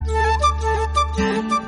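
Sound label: message ringtone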